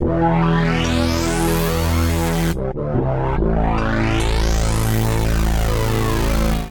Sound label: A Minor 143 bpm, bassline; MIDI Clips; Tonal; Freak Out; Skitter and Step; Ableton Factory Packs